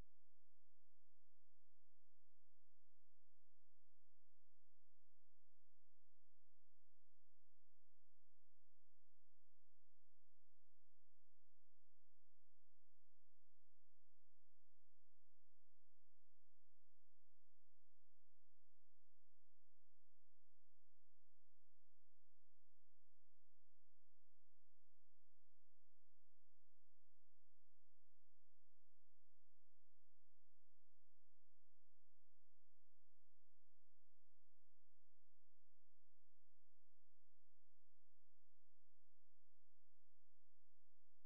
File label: noise pink lr inverse